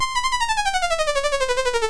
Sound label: Flight of the Bumble Bee BASIC, MOS6581R3; Latimer Joey